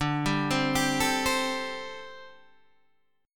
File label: D 7th Suspended 4th, down, Guitar, Open G